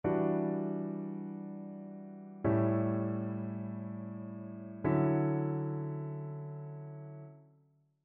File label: ii V I progressions; ear training